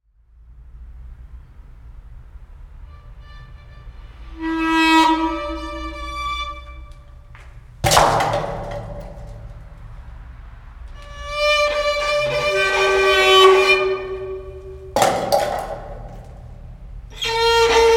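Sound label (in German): Tunnel Duo mit Geraeusche; Blumenberg Tunnel; AkustOrtErkund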